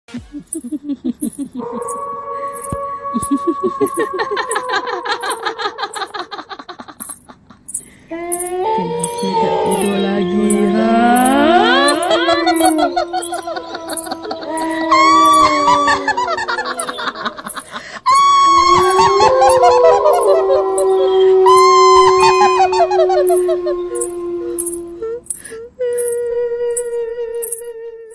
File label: new trending horror ringtone horror ringtone; bhootya